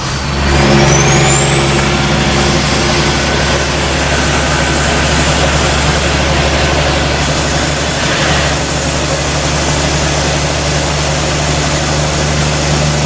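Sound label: third, car engine; tdmcars; kwt800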